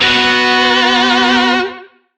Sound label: Shawzin Chromatic Chord; Warframe; Lizzie Shawzin; Chords